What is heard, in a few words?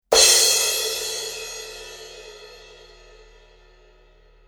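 Loud Hit, medium thin cymbal; sabian aa medium thin16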